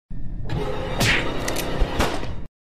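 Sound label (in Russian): ubiystvo pistoletom, pistol kill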